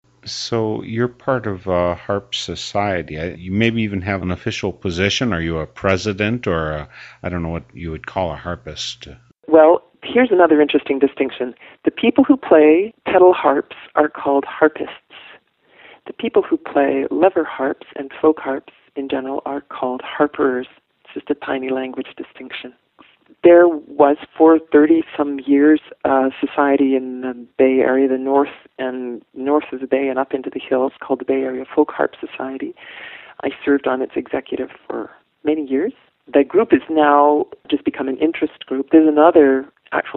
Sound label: HARPIST